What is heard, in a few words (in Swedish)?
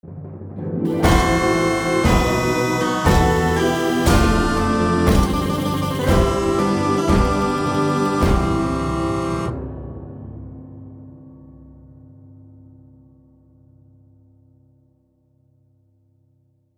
Brollopsmarsch